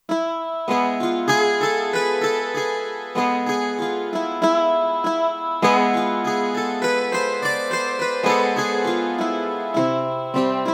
Kotolin, Kurzweil K2500X; Factory Programs

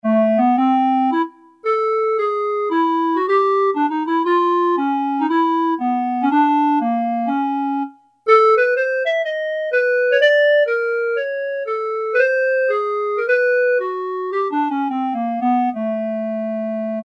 Clarinet, Roland D-110; Factory Patches

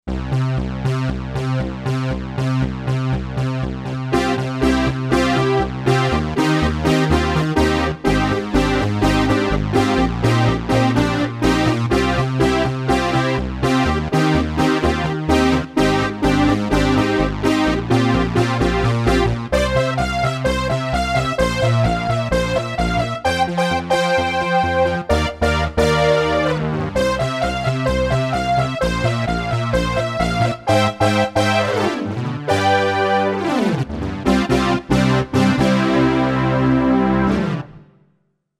Poly Synth; Roland SC-880; Factory Patches

